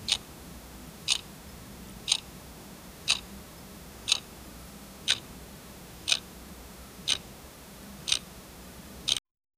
a very faint clock tickin

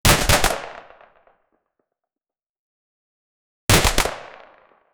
single sp5a3 9mm gunshot

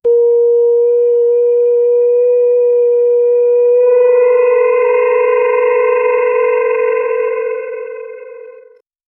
no music pure sfx warped, wobble

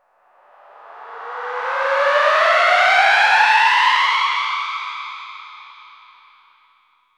riser, build up

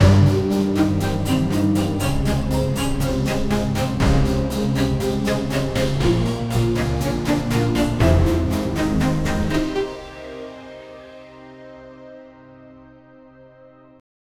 epic soundtrack to anime; anime opening

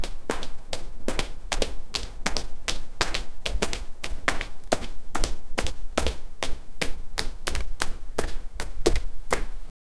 year old kid running, wet concrete

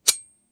a loud and rapid blade

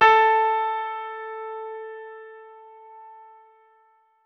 one note of high quality, a4; SFX Engine

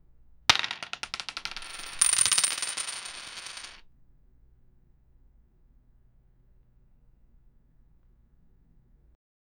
a line of human sized wei, chain

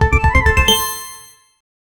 reward sound superhero st, positive

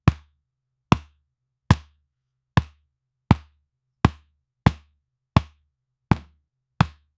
realistic outdoo, basketball

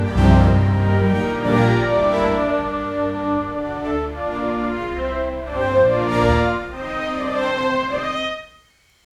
music folk music

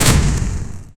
game sound effect, steam release

